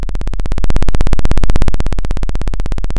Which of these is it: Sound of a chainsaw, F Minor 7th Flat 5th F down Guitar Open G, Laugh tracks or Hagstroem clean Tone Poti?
Sound of a chainsaw